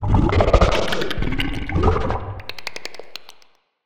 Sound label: Alien Passying by, Monster Sounds; TheDeclineOfWarriors; Monster Sounds Volume II